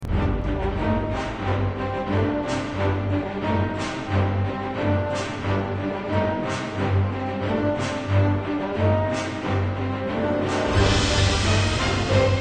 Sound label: music battle